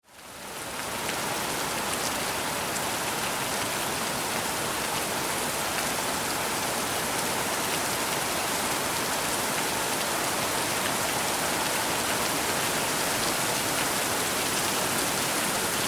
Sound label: rain ambience